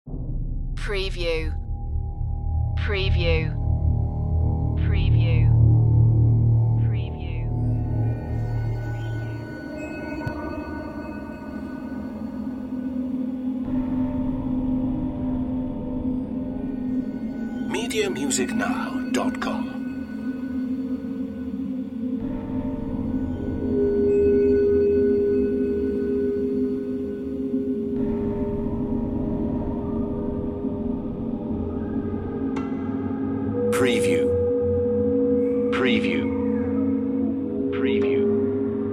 dark ambience

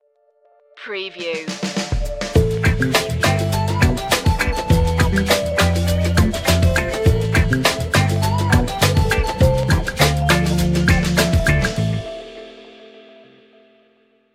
get funky

